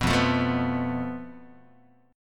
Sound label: F Major Flat 5th Guitar, chord; FMb5 strummed; JGuitar